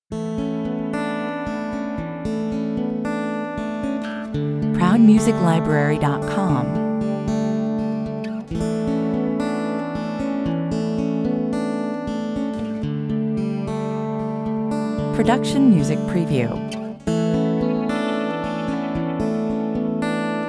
two guitars 130 bpm

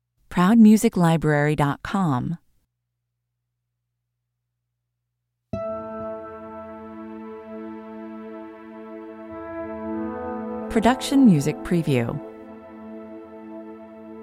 activate (no drums no perc no bass)

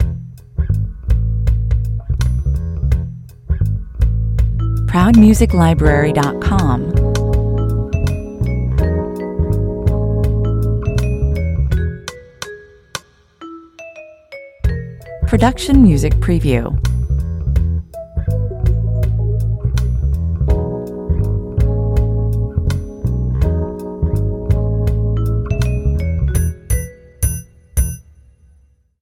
on hold 82bpm

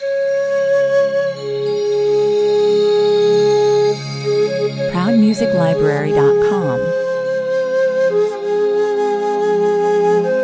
yogi flute 68 bpm

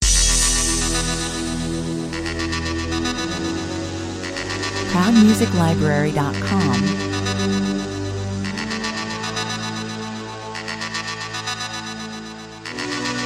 break u off trailer 114 bpm

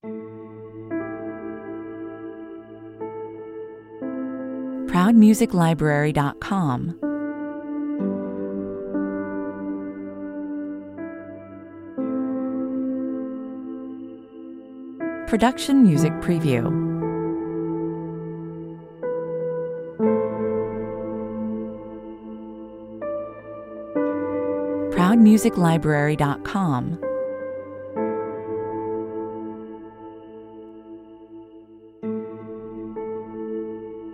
all is lost (slow) 110 bpm

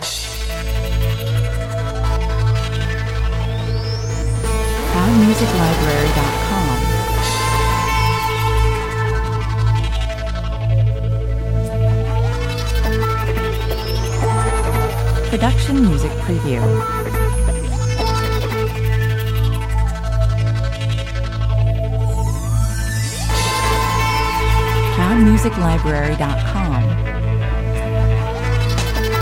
reloaded matrix (ambient mix) 130 bpm

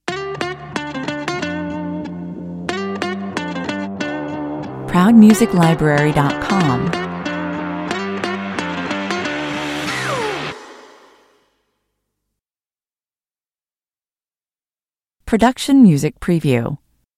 brave beats (stinger)

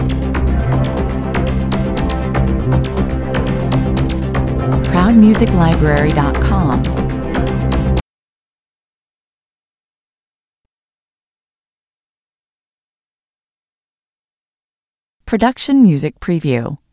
goa techno loop

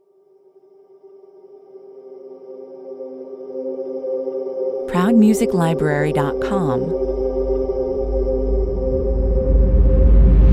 Reduced Trailer pads with smooth suction sfx

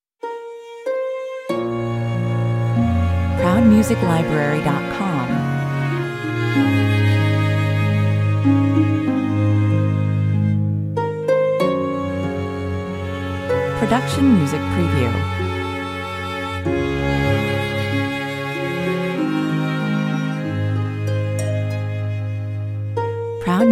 (small strings) 95 bpm

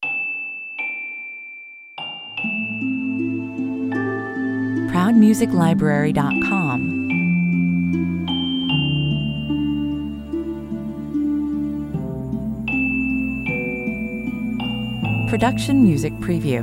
dueling children 102 bpm

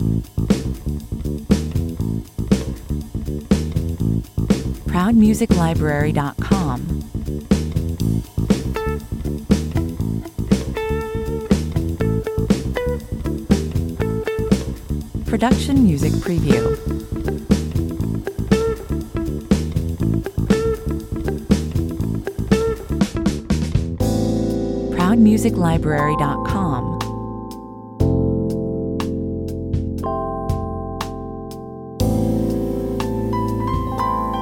jumping jazz, 120 bpm

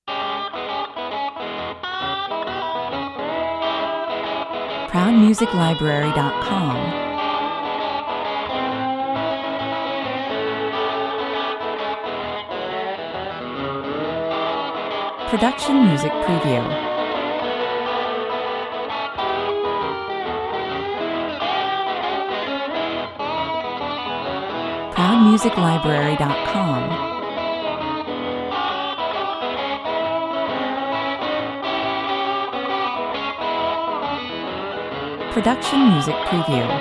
rough house blues, 113 bpm

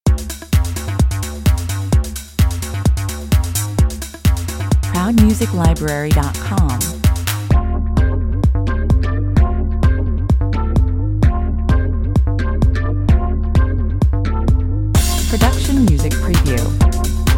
techno waves 129 bpm

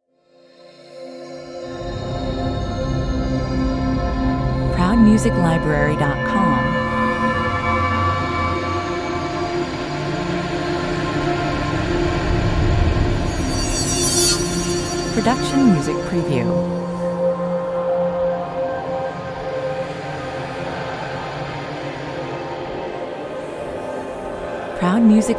vapor noise ambient

